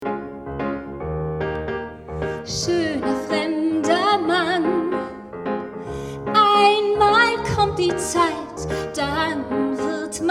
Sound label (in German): Gesang Show